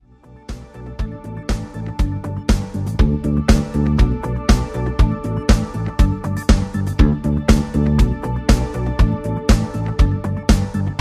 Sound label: rhythm